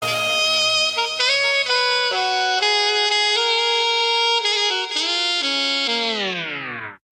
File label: Sax End